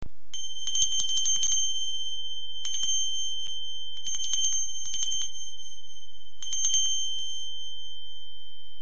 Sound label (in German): pferdeglocke